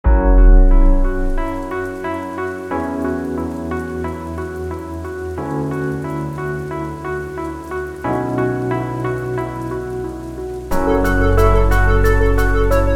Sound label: synthwave